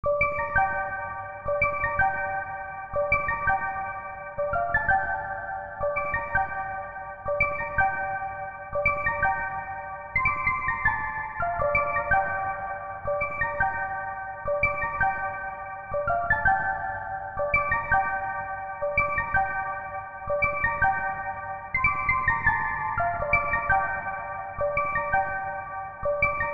Twinkle Pluck Synth

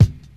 Kick; ForestHill

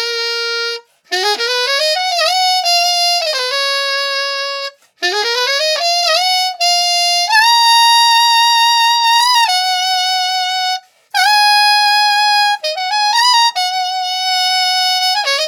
118 D Sax Loop 124

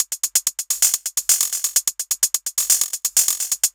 hat loop, 808, flammy, 128bpm; GJaxx

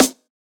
Snare

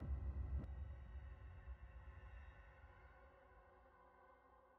95bpm Impact

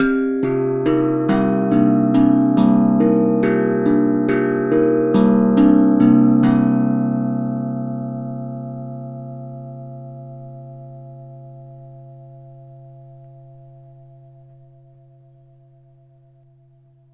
70, C, Tank drum

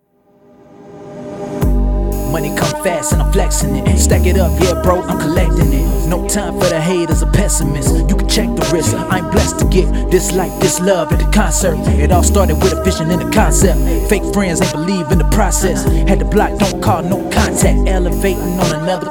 Hip-Hop Vocals

